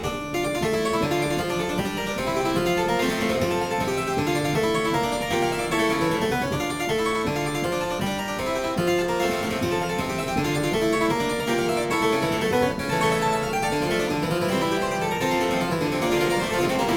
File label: Suite in D Minor - Variation, Harpsichord; Suite No. 3; HWV 428; Handel; Suites for Harpsichord